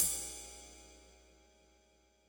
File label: RIDE, Yamaha DD-65 CYMB